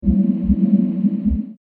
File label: Dramatic Alien Heartbeat, science fiction sound effects